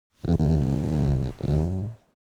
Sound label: animal dog small growl very soft; smartsoundfx